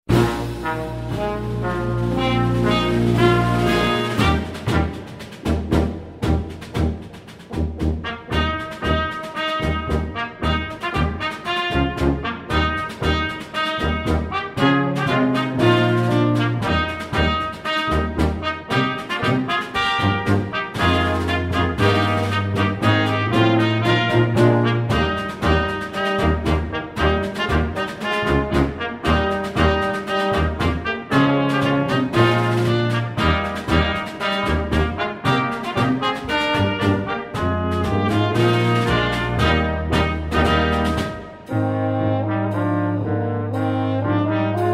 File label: Victory March, Brass Grooves